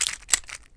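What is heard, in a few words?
charge reload fast speedloader, pistol; gmod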